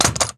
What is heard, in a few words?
weapon shotgun impact; physics